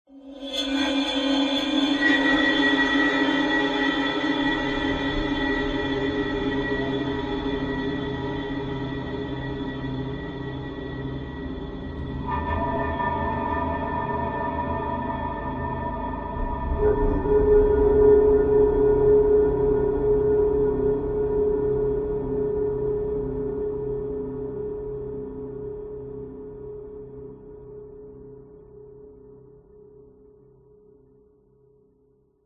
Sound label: horror drone atmos dark eerie mysterious sinister; zapsplat